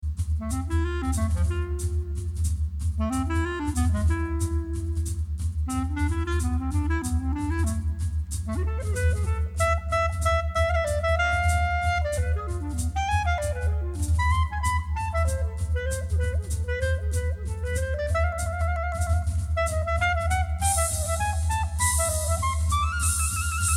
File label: Swing Swing Swing CLARINET